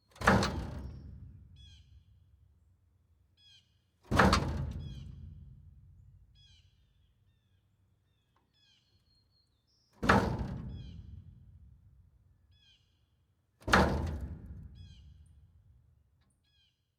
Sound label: closing dumpster door